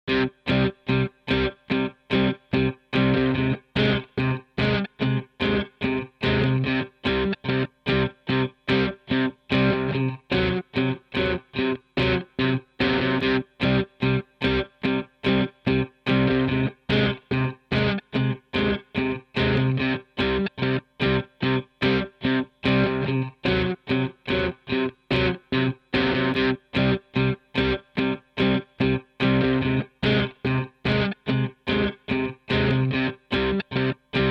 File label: Guitar Loop; Super Millionaire II; Millionaire Soundtrack